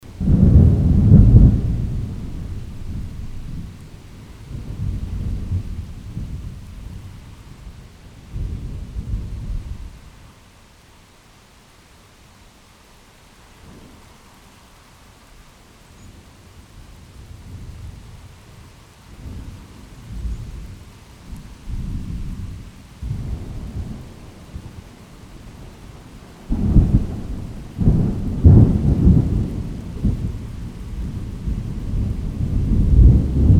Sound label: thunder, thunder storm